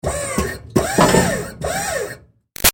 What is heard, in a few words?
Servo Moving Truck Short; Motors; Machines; Big Room Sound